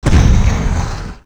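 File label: Explosion - Short Dull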